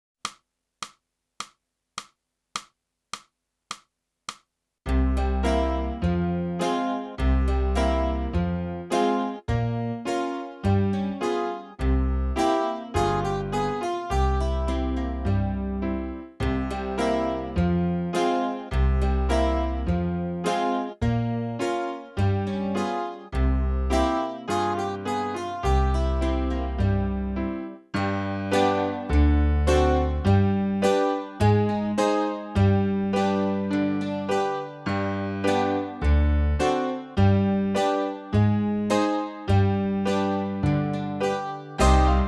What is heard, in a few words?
sax